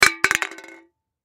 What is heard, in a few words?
Can Drop Cement, Can Drop Cement Aluminum Empty; Drops; Foley